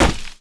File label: tree hit